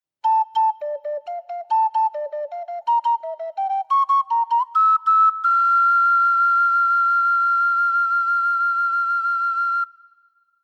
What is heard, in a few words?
Ocarina